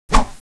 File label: swing, sabre; weapons